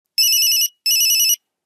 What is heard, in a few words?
bee; zvuk nokia 3310